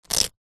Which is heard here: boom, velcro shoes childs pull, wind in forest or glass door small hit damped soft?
velcro shoes childs pull